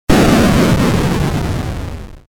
8 bit game explosion sound effect; game of thrones

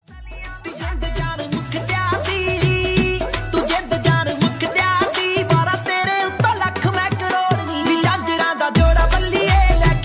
Punjabi Songs; Lahore

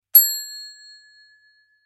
ding small bell

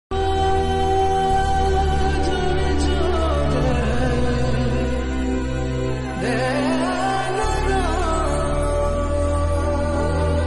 malayalam film song; Om shanthi oshana

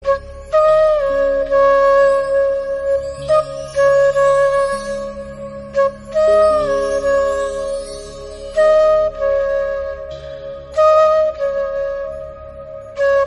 Instrumental; Ek Onkar

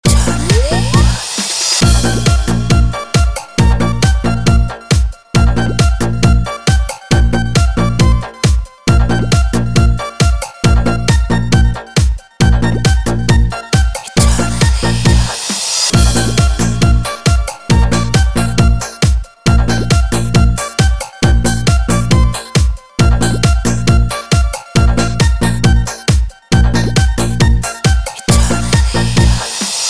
remix dance club mix

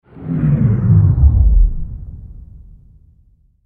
deep sound tape stop

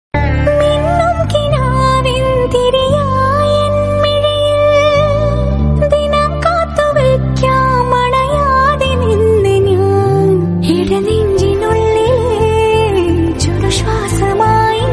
Female Version Malayalam Song

